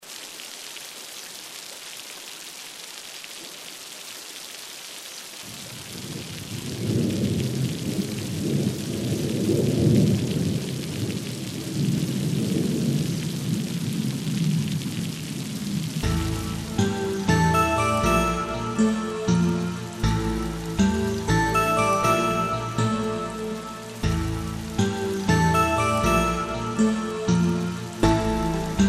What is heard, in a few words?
horror rain